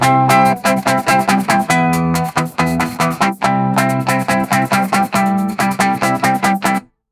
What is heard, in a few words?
midwest emo electric guit